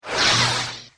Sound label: saber hit wall